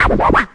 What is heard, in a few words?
basic scratch; Scratching and Turntablism; DRUM LOOPS and BREAKS